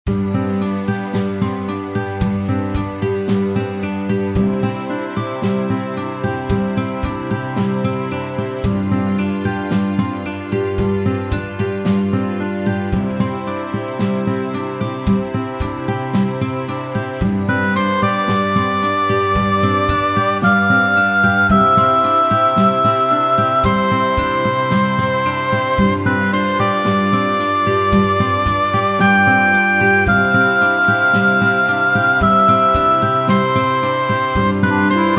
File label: guitar